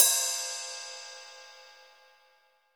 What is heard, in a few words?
16 RIDE